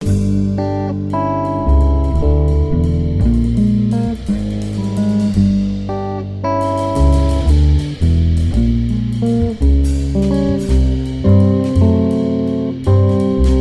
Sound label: Blues in G; Art of the Blues